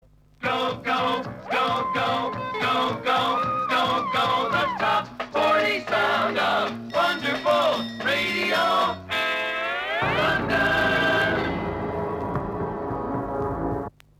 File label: jingle - go, go, the Top 40 sound; R London